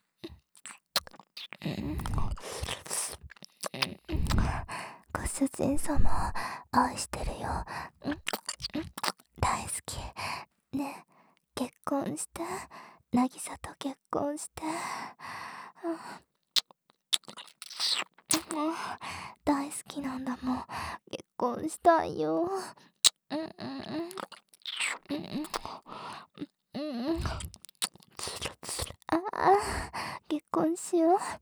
耳舐め 喘ぎ

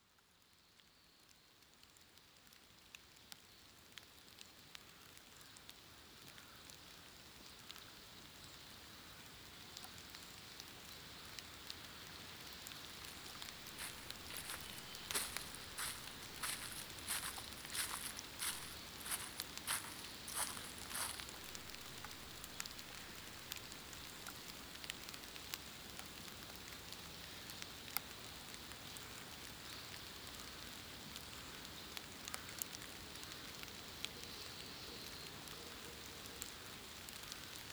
雨の森環境音。遠く列車と鉄道の音; 「雨のぽんぽこ森」

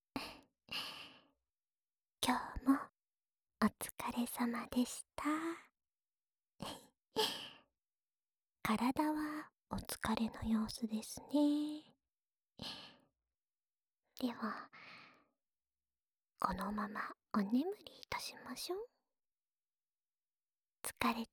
吐息たっぷりの添い寝ボイス・右耳, ASMR

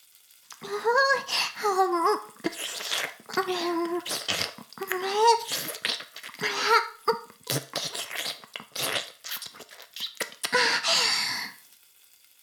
フェラ音声; 古き城のドラゴニート2

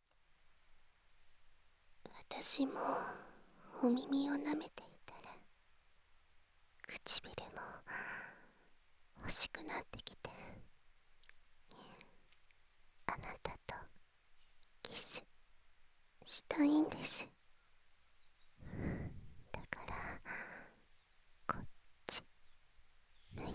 キス

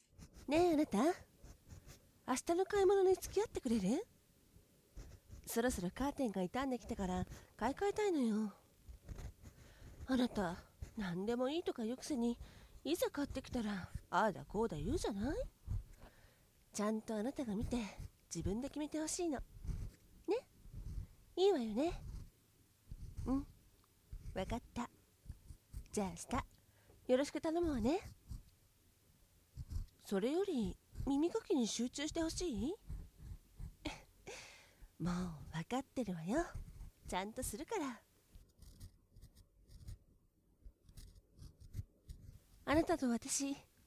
嫁の耳かき, ASMR

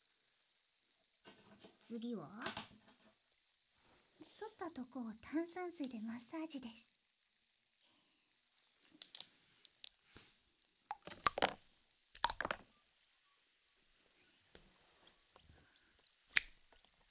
剃り跡炭酸マッサージ, ASMR; 炭酸スパすーぱー

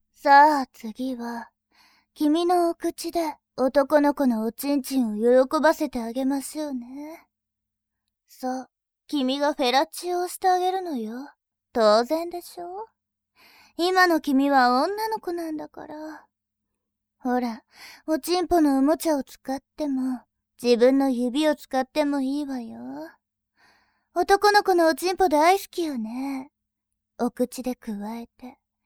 フェラチオ

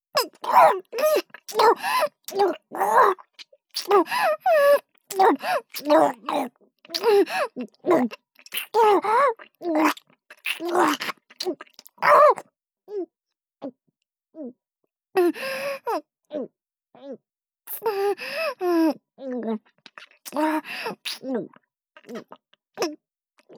口内射精 ＋ 精液ごっくん ループ

